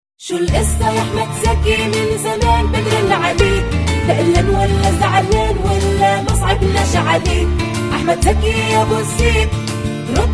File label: Tone